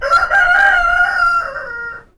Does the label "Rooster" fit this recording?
Yes